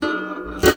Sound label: Classical Guitar Extended Technique